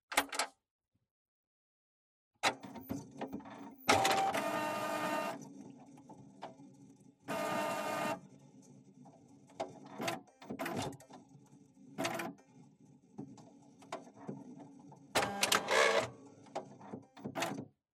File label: Printer On